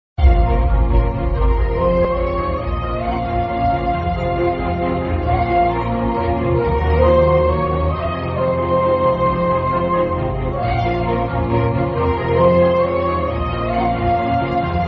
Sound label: Emotional BGM; Thiru